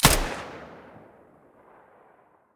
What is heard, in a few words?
silencer p90 stereo, weapons